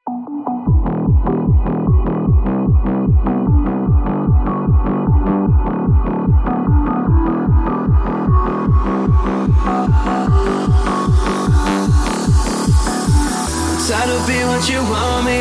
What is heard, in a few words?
Techno Version